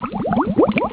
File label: bubbles; Insaniquarium; popcap